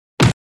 Punch Body Hit With Thud; Fights Bodyfalls

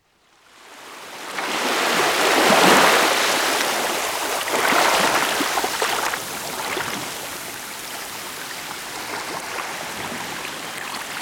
Waves Breaking On the Shore; Dr. Sound Effects; 210 Nature Sounds - 20 Hours of Relaxing Natural Ambiences for Meditation and Sleep

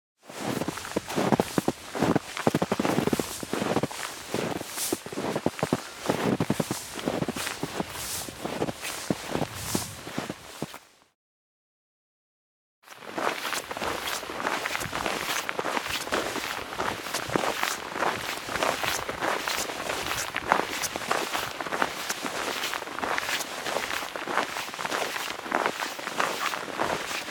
Footsteps - Snow and Ice Sound Effect; christmas

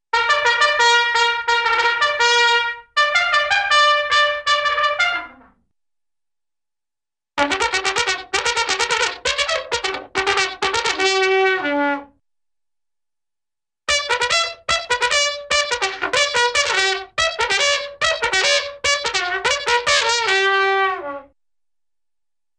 Army Bugle Messes Up, Cartoon; Hollywood Edge Cartoon Trax

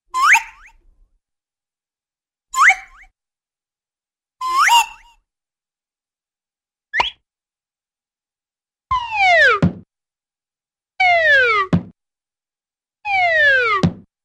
Slide Whistle Sounds; Hollywood Edge Cartoon Trax

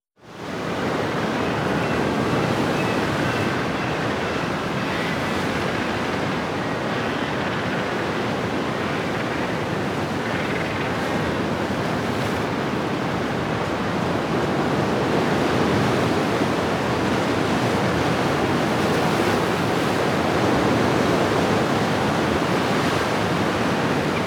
Steady Waves Hitting the Shore Seagulls; Dr. Sound Effects; 210 Nature Sounds - 20 Hours of Relaxing Natural Ambiences for Meditation and Sleep

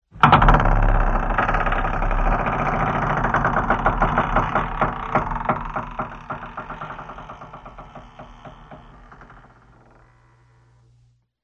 Creaking Door Close; SOUND EFFECTS1

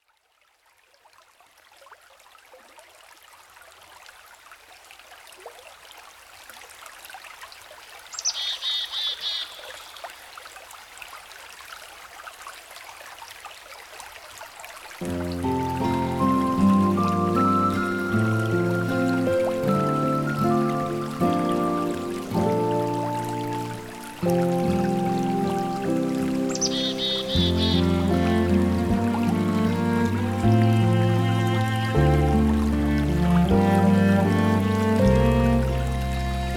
Forest Cello

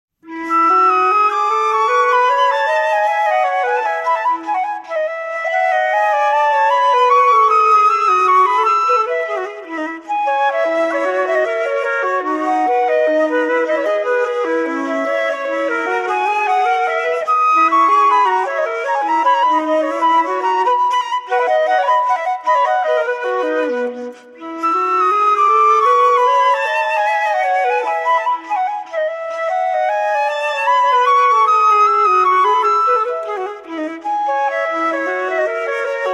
2 part Invention on 2 flutes; Andy Findon; Bach and Telemann; Johann Sebastian Bach

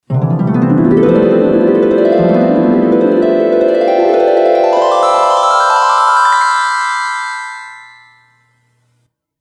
Dream Sequence; Best Of N2O Library

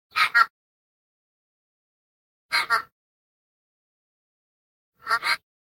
Echo, Echo Rev; 100 sound effects

